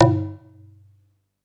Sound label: PERC G1; SEQ AND PERC; Music Store - Claudius Diemeter Schwerlos